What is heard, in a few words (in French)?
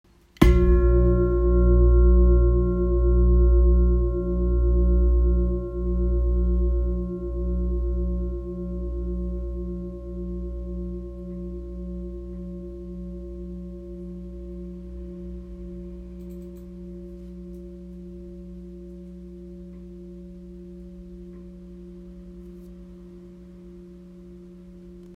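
Bol grave pieds la dièse 8.1kg, Bol chantant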